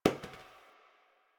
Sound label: Janitor Paper Towel Drop; Warframe; Ambience; Nineteen Ninety Nine